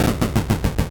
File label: 8bit se